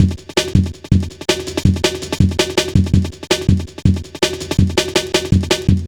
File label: Funki, Jungle, Drum Loops 163.5; Zero-G Jungle Warfare 1, 2 & 3